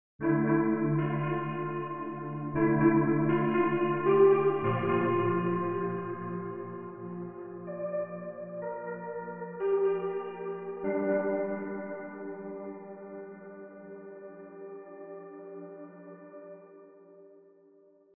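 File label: piano, virtueller Konzertflügel; melda; monastry grand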